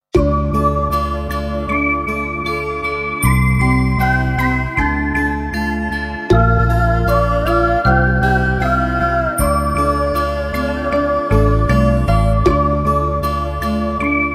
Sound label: Om Mani Padme Hum 432hz; Ocean Media; Nhạc Thiền Tĩnh Tâm